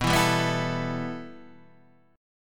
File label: B 7th Suspended 4th Sharp 5th